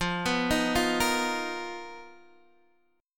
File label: B Major 7th Flat 5th F, chord shape